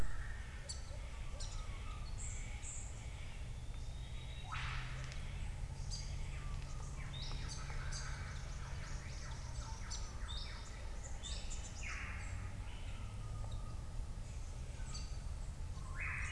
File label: soundscape